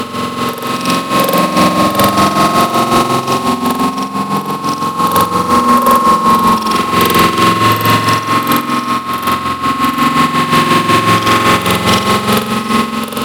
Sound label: Energy Shelf Loop; Warframe; Ambience; Guild; Props Remaster